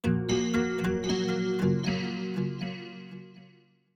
Jingle Treasure Room Discover; The Binding of Isaac Rebirth Afterbirth

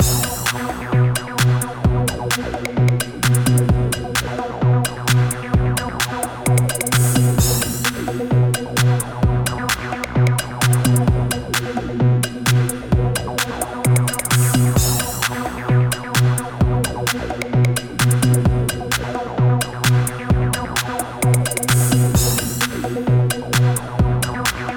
Dialogue Theme; AppleCore

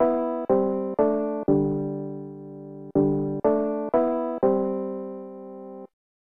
Chime; tokoro s mahjong; snes

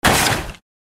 Impact

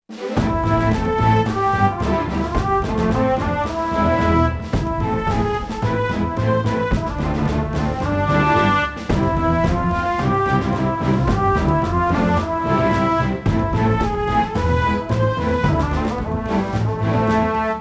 Duel Music Intro

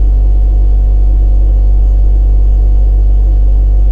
APPLIANCE Fridge Hum Exterior Bottom loop; mono; Don't Be Afraid The First Toy

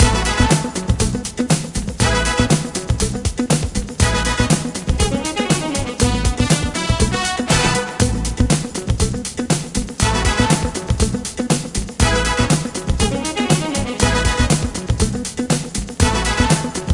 Music; Dance Dance 1; Wedding Dash

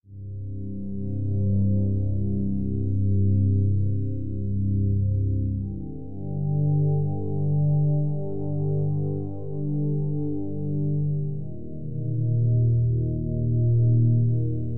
Puzzle; another perspective ost 2014

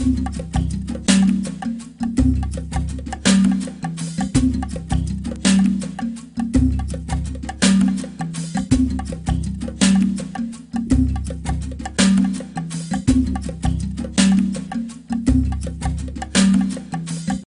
Jungle Level

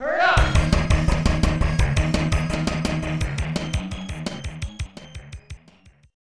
Urgent Message!

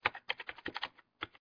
Intro Typing; Learn to Fly 2

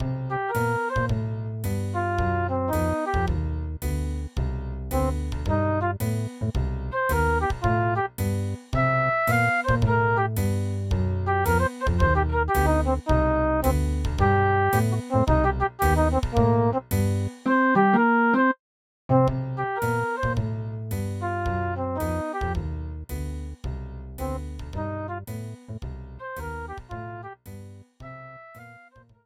Roland SC-55; SimCity 2000

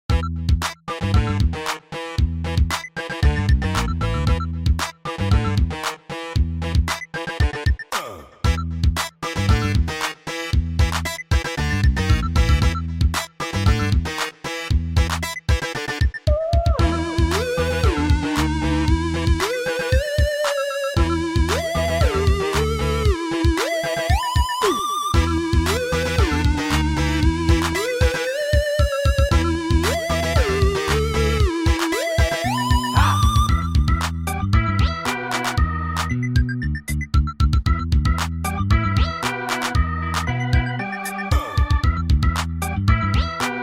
Pause Music; Green Man Groove; Friday Night Funkin' Martian Mixtape

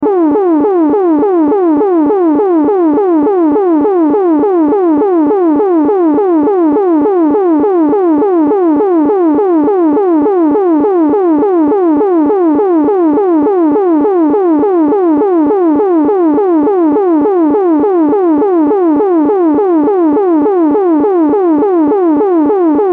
Alarm; Yume Nikki; Kikiyama

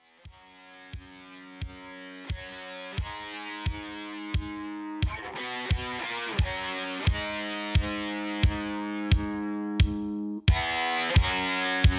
Game Over; Half-Life Caged; macOS; Windows; Bonus Level Exit

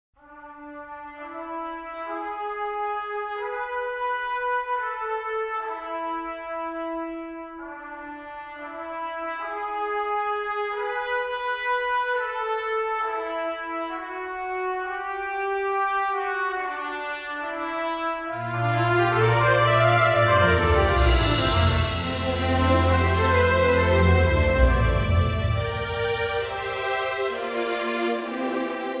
World Music

